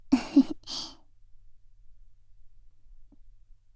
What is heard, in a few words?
laugh, ASMR; Garden; WithRin-Nazo01